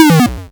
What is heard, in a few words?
lose; kenney desert shooter pack